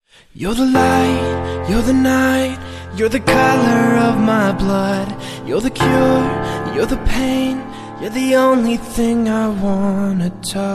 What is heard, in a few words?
Mobile Ringtone